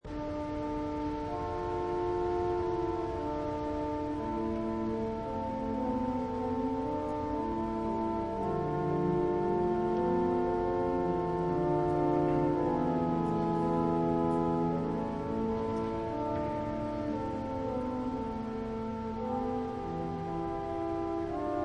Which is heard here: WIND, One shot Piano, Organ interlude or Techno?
Organ interlude